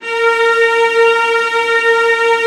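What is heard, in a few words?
VIOLAS C5; ILIO; Synclavier Strings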